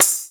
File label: R8 perc; Greytsounds VOL-1; TAILORED R8